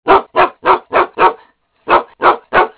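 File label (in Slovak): pes, dog; zvierata; domace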